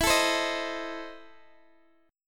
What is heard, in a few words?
E Major 7th Suspended 4th Sharp 5th E, Guitar, Open D; chord shape